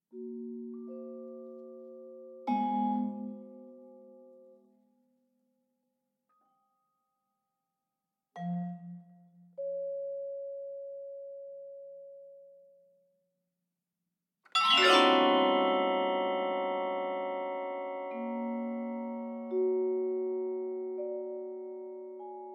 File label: vibraphone solo